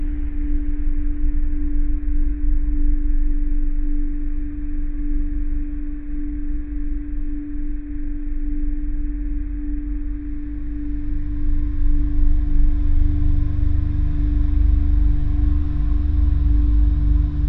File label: Background Eerie Ambience